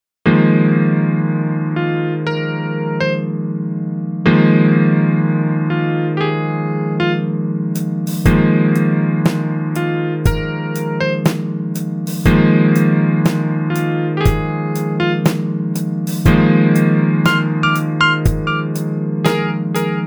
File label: go jazzy dry